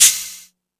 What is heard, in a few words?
Jungel Percussion, E-MU Pro-Cussion; drum machine